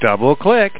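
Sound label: double click, vocal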